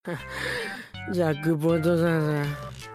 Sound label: Sleepy Dice